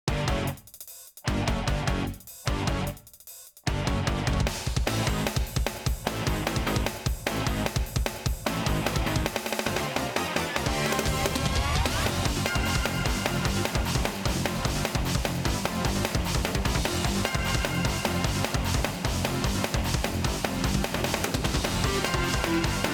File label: Battle; dragon slayer the legend of heroes ii pc engine redbook